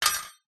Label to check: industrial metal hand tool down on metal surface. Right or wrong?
Right